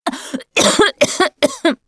Cough, russian

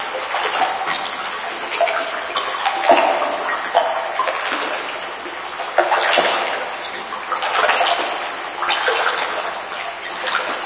tunnel water loop, ambience

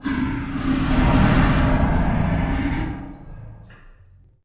Motor Sucky; Ambient; Sid Meier's Civilization 4 Complete; Beyond the Sword

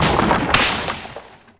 Trebuchet Stop Leaves; Sid Meier's Civilization 4 Complete; Warlords; Units

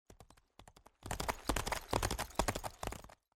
Horse; Age of Empires III; Back Horse

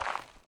footstep; Mount&Blade Warband

